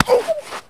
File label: Male Hit By Pitch Baseball